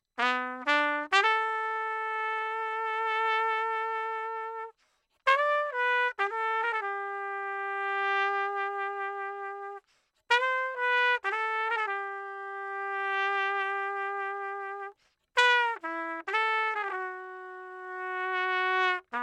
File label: trumpet; lewitt